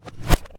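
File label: Photocopier Lid-Close Reverse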